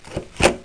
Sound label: Another Successful Opening; Curse of Dragor Demo